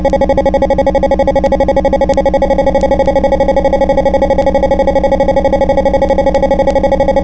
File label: ship foghorn